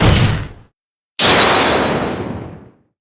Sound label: slam super objection